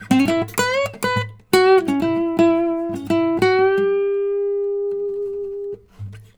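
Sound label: baritone lick E, Blues Guitar; ILIO; Fretworks; 120 BARI LICK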